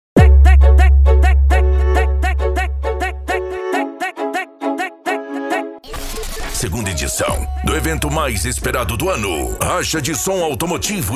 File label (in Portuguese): racha de som automotivo e som musical